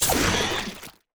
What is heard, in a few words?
vivarium cull; Stellaris; grand archive